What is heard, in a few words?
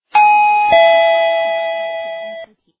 dingdong doorbell